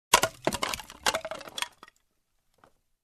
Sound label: Wooden sticks rattling